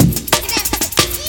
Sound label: 4 processed breaks compressed 185bpm